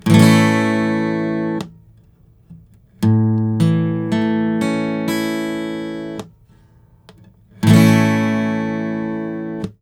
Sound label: Major or Minor